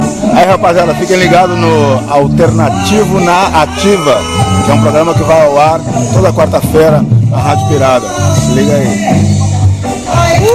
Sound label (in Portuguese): vinheta; vinheta-dionisio